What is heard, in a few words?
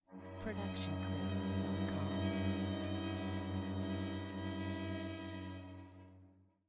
Violin Cello Dissonant